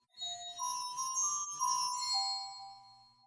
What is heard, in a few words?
Glockenspiel